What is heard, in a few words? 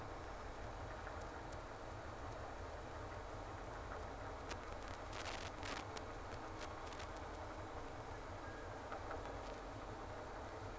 Whales; SeaSnake